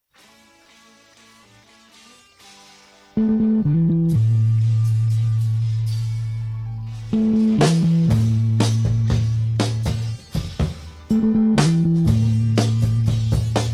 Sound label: Bass Drums; song stems